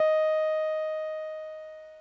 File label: ebm, Omnichord